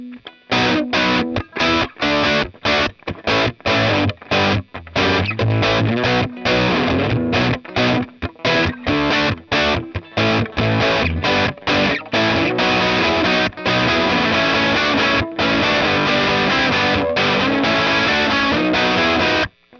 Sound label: rock dry, Swart STR-Tweed; Rock Sample - DRY